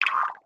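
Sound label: enemy Mung Damage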